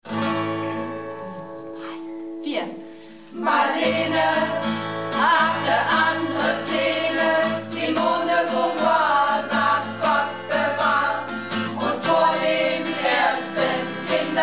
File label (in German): Beide Stimmen